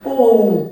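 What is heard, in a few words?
OHH, SOLO FEMALE; Spectrasonics; Heart of Africa 2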